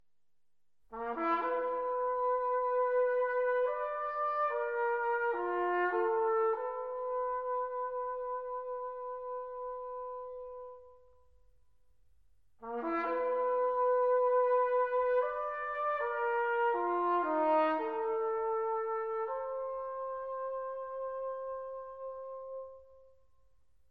Triptych For Trumpet & Orchestra; Mvt. 2 Main Movement

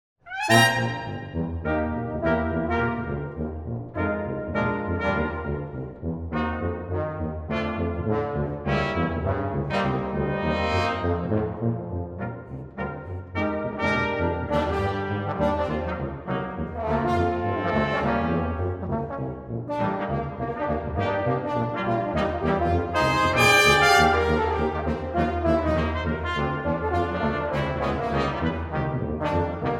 Brass, Brass Quintet; Brass Basiecs

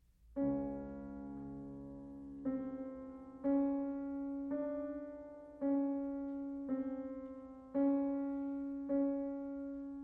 Sonatine for Clarinet and Piano - Mvt. 2. Andante

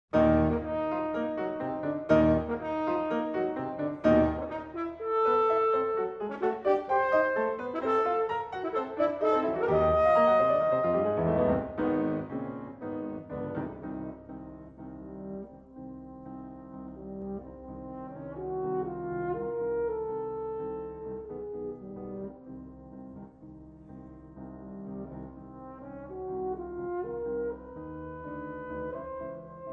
Sonatina For Horn & Piano - Mvt. 1. Allegro

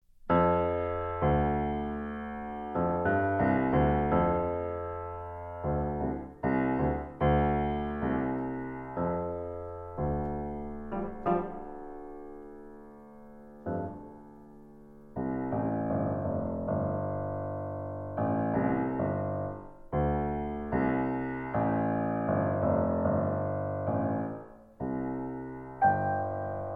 Little Suite for Piano, Lullaby; Keyboard Classics for Children; II. Jimbo's Lullaby

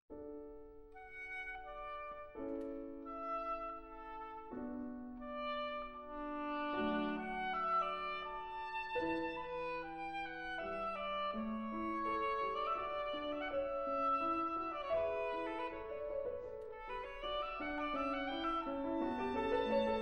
Sonata For Oboe And Piano, Andantino

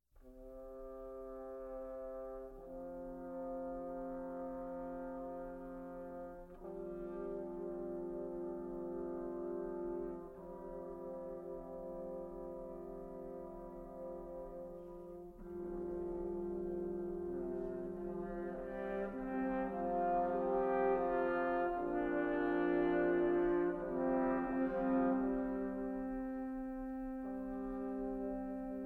Quartet for Four Horns, Prelude

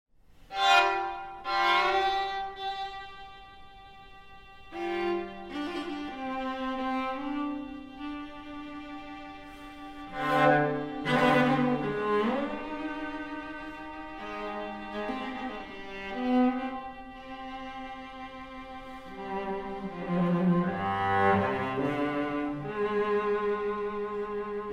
Quartet For Piano & Strings

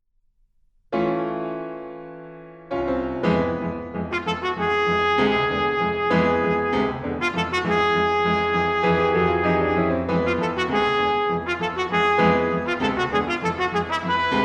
Vocalise, Trumpet Vocalise

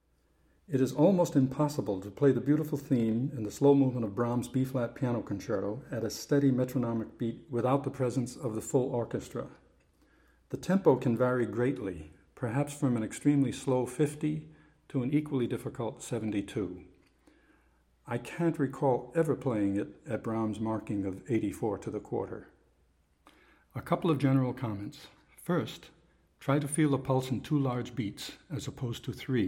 Piano Concerto, Cello

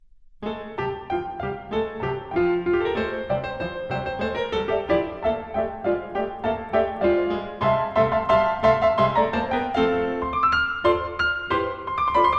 Allegretto, Piano; Martinů

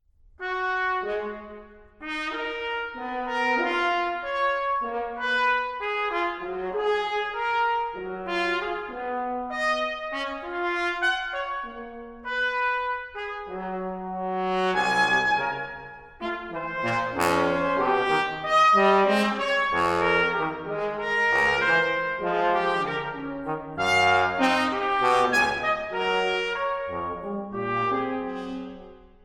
Brass Quintet, Moderato

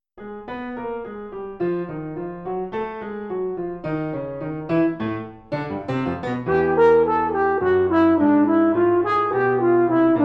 Suite for Horn and Piano - Mvt. 1.; Alec Wilder

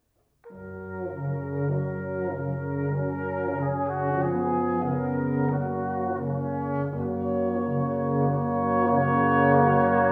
Brass Quintet In B Flat Minor, Adagio

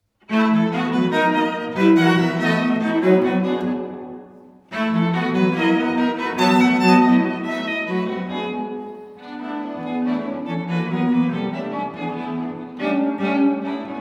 String Quartet, Vivac

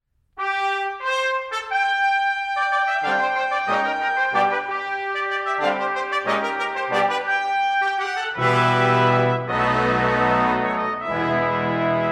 Symphony for Brass, Allegro

